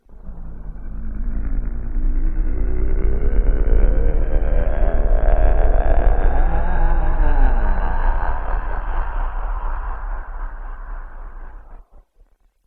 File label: weird slow